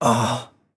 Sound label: Grunt, MVoice